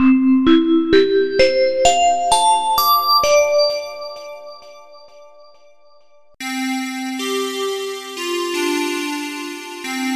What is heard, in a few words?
Bells